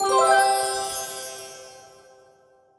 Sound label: Sfx Get Star